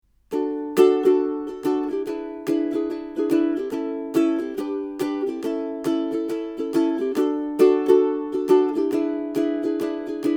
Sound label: Strum, ukulele